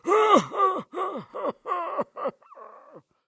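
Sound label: sounds of male crying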